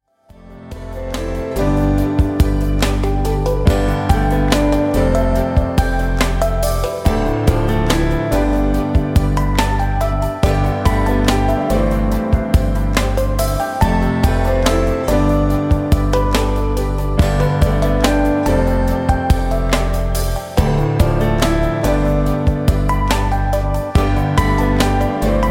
Lounge

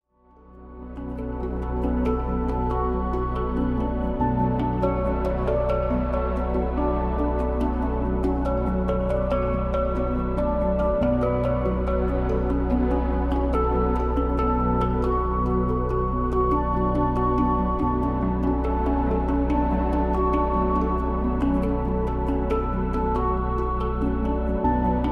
Deep Relaxing

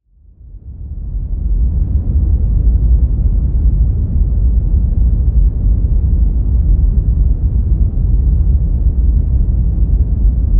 Deep Red Noise

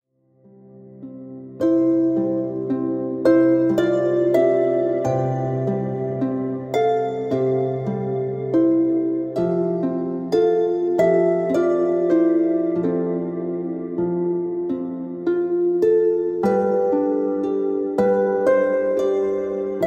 Dream Harp

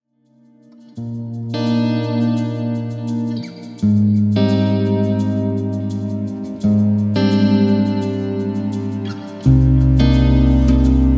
Healing Native Flute

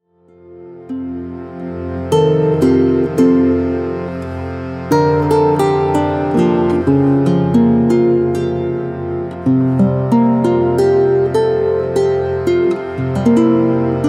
Shruti Box Journey

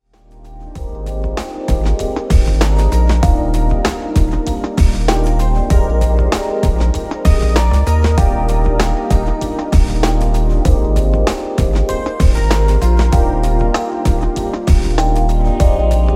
Chill Out Lounge

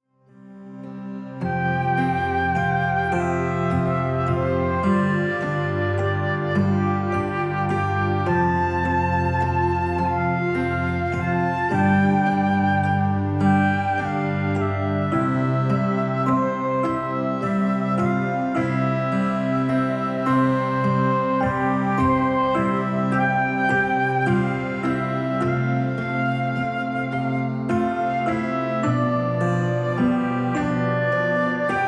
Baby Lullaby

